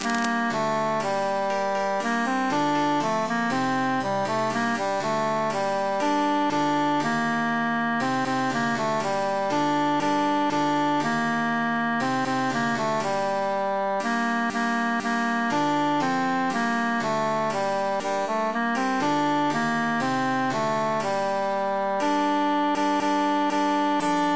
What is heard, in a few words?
tenor emphasized; la la la